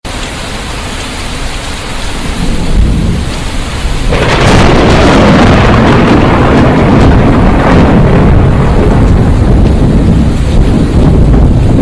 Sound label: Thunder Storm